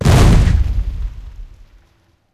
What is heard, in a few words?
mine explosion; explosions; War Thunder; tanks